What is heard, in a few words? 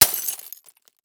Impact Glass Smash With Hammer; Impacts